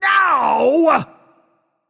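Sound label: nonverbal ouch; voicepack; smite; merlin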